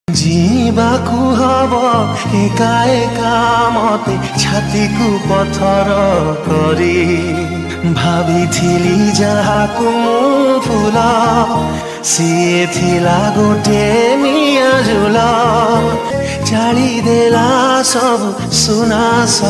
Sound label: Odia Sad